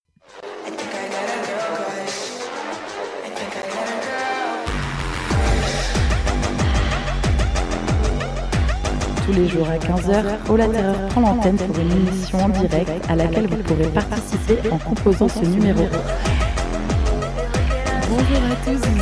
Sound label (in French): jingle emission; ola te parle